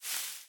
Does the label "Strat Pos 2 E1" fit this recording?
No